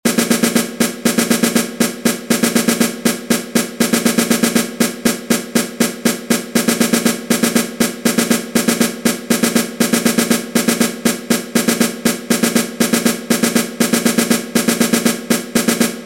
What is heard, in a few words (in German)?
Rhythmusuebung 16tel und 32tel im Wechsel